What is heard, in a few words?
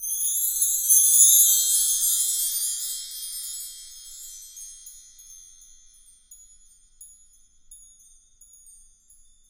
Wind Chimes; Roland LCDP03 Orchestral Perc